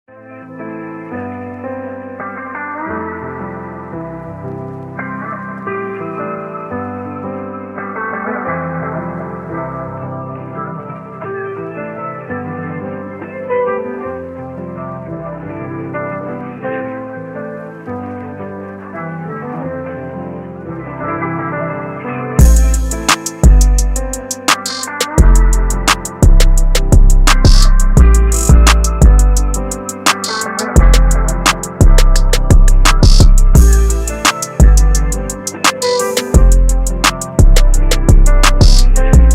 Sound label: instrumental